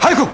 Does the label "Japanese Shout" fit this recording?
Yes